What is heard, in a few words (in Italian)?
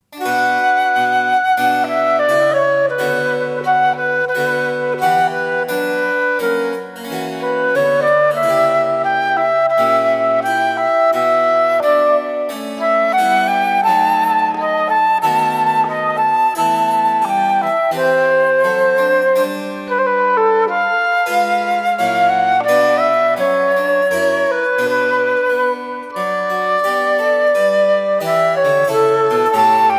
flauto largo; albinoni